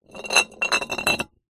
bottle 660ml glass beer empty short roll on concrete